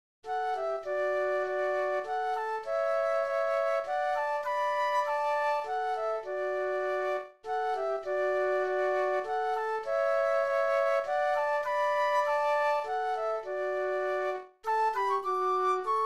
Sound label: Flute Duet